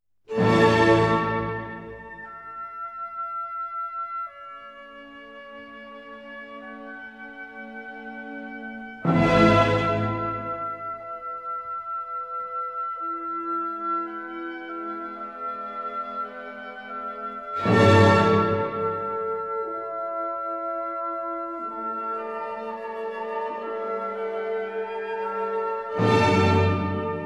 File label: Symphony No. 7 in A major Op. 92 I. Poco sostenuto - Vivace; Ludwig von Beethoven; Legend of Galactic Heroes; Galactic Empire SIDE